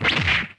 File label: Punch